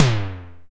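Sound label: MKS TOM LO, analog toms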